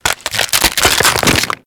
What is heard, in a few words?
Infested Diseased Ancient Chest Retract